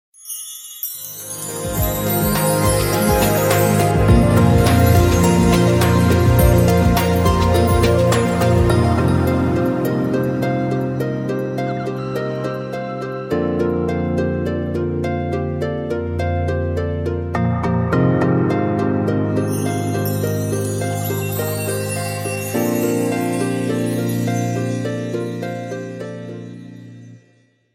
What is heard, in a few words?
Ring